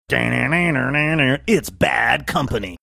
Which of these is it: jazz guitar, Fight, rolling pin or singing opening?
singing opening